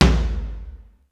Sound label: lo flr rm; sr16 tom